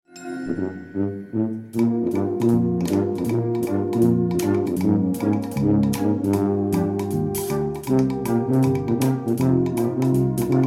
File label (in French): Tuba; Tuba Contrebasse; La Batterie-Fanfare fait partit des trois orchestres de la ville de Marnaz